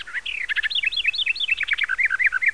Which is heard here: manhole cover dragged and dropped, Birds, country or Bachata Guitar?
Birds